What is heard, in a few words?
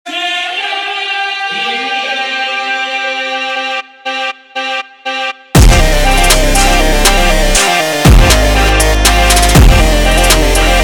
ultra Slowed, funk